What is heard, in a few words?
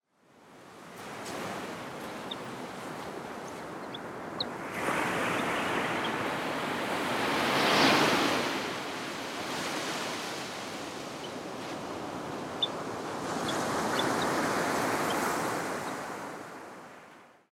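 Sanderling; miyubishigi; sousa; koe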